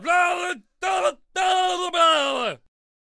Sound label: Brawler Attack Vocals